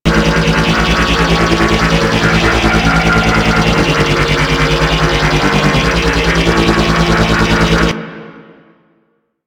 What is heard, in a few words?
Powercell Spin Loop; Warframe; Corpus Railjack; Fueling Station